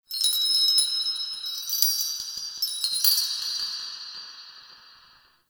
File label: Metal Chimes; Warframe; Ambience; Nokko Colony